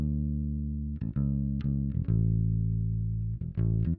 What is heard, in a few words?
bass; musdb18